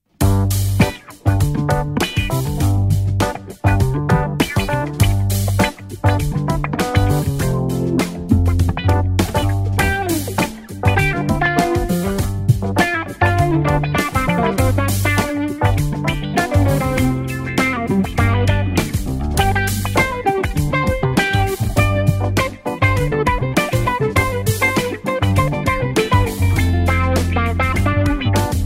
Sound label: Envelope Filter